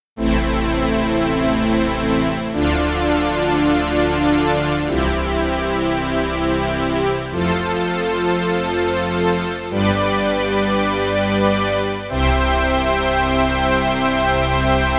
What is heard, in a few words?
strings wet chorus